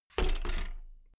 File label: bmx bike land impact rattle